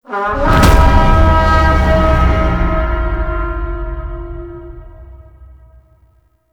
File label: Battle Stinger; Edens Ultimatum